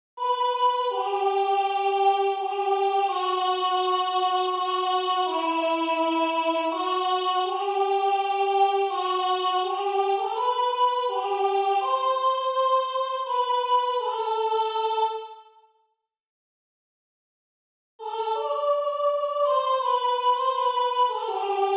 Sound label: soprano